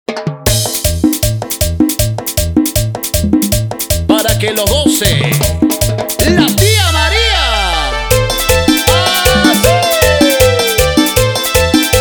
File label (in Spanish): Intrumental Ec, BPM 157